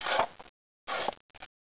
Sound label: quarry; Caesar 2